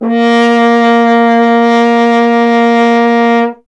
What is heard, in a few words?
F. Horn; Miroslav Vitous; Solo Instruments VOL-2